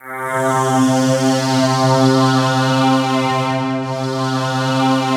C2, SynthLine

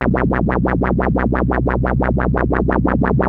ARP 2600 A2, synthesizer; Hollywood Edge - Giorgio Moroder Rare Synthesizer Collection

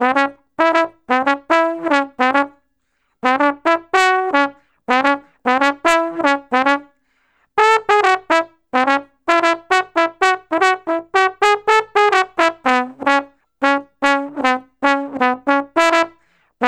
Trombone Solo, Jazz

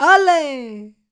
Flmnco F Vox; Roland BK-5

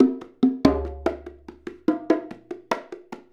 Bonga; Essential Percussion

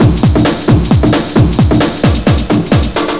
Old Skool Rave break

